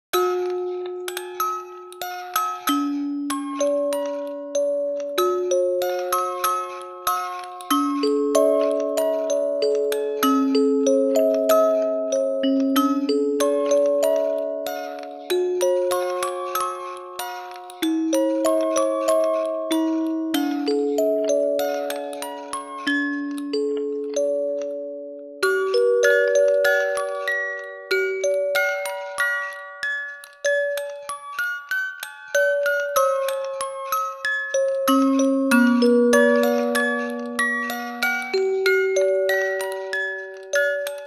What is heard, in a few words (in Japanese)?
オルゴール ver.